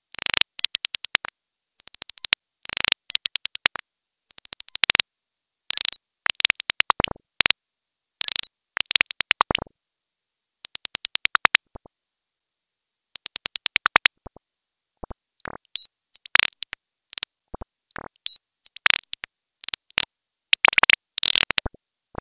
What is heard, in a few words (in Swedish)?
tradgardsfink; Swedish birds; Sädesspov och andra fåglar